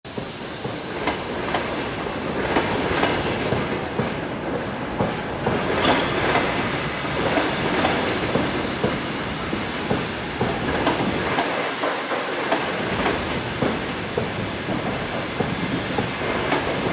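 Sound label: railway station noise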